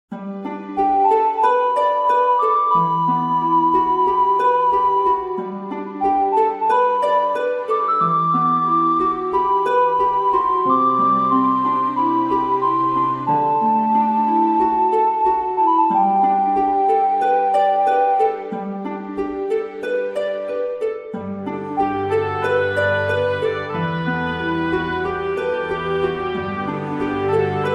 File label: town theme